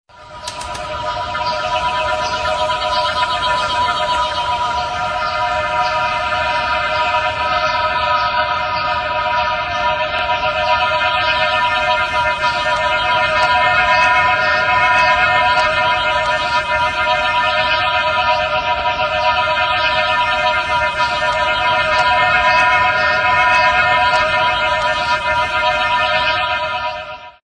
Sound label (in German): Chinataubenpfeifen